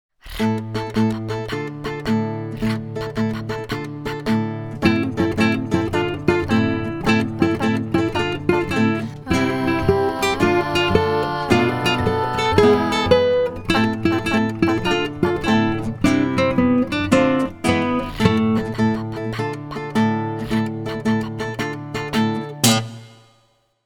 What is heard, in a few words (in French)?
accompagnement guide